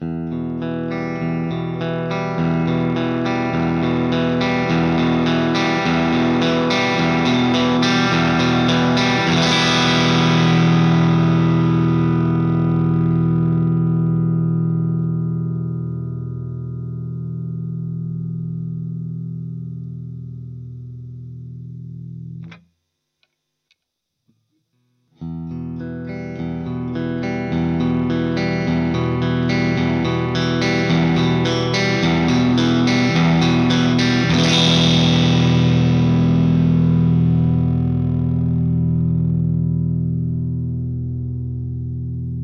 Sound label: jtm45, tele pickups; sheptone tele pickups